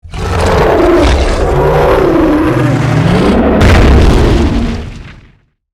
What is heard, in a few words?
die; Anger Behemoth; Fly For Insanity